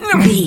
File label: voice male attack